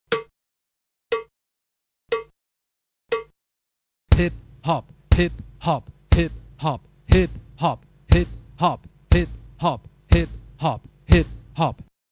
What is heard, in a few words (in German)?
Uebung, schlagzeug